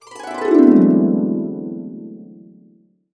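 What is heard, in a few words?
D2, Complete Harps; USB Soundscan vol.62; AKAI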